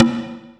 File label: timber, Misc Percussion Ticks